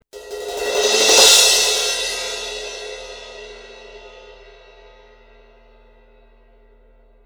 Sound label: 18 medium crash; sabian; aa